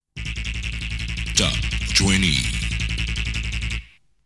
Jingle

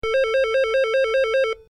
Ring

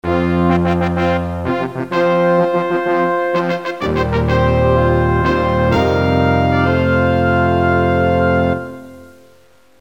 Brass

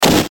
Ric gun

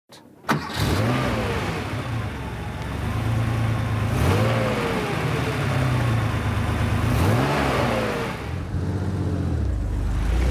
Porsche 911 Turbo 3.0

